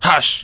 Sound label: key pound, Keyboard; ZTE R90